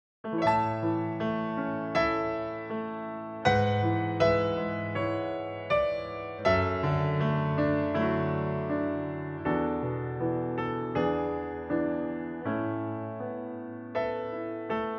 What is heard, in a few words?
A piano